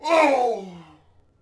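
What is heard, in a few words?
Warrior Death Vox; Civ4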